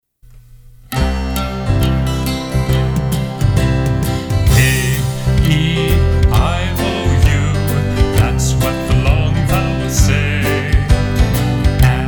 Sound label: Vowels Song; lettersongs